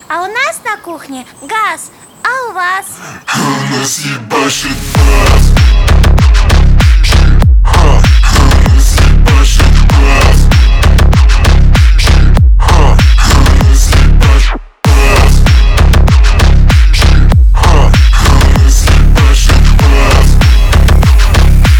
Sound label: bass